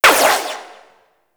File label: Shot, Double Barrel Shotgun; Warframe; Weapons; Tenno; Tigris Prime